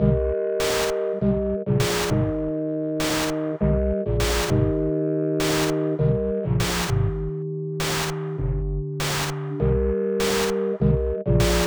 sinewave

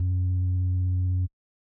1K sin wav

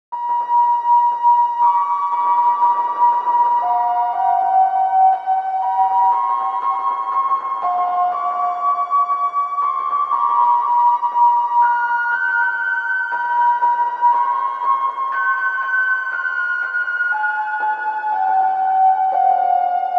Sinewave 48k

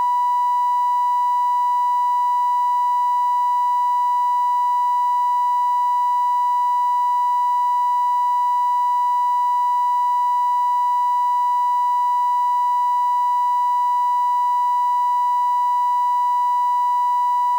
Sinewave

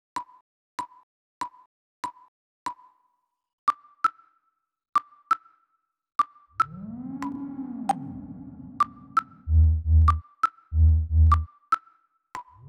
Sinewave

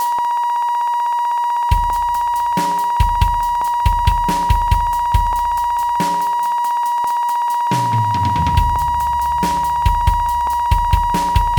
sinewaves

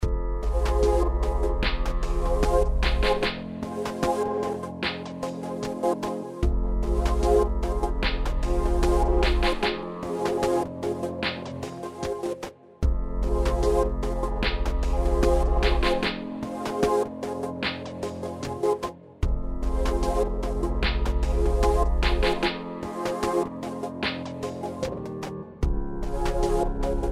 midi

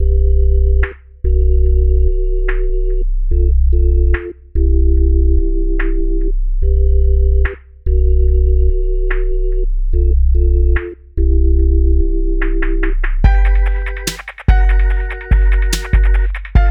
Sine Wave

